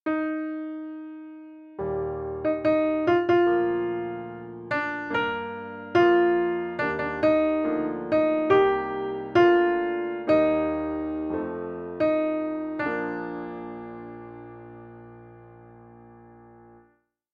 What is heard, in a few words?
FOLK Melody; Kibibi